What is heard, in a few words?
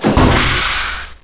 pow big jump; gearbox